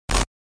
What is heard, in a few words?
Error glitch